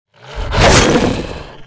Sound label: attack